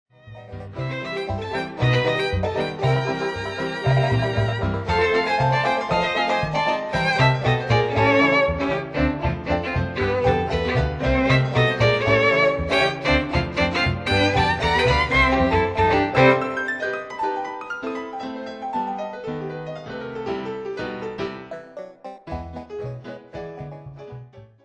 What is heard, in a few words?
violin